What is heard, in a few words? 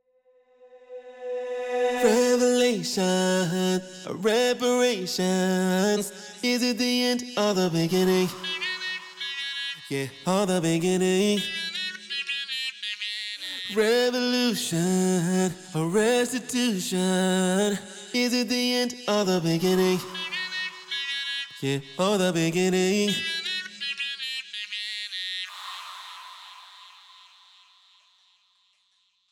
acapella example before vocal resynthesis; ovox; waves